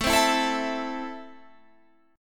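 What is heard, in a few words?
A Minor, Guitar Standard, chord strum; Am strummed; 17,15,14,14,17,17; JGuitar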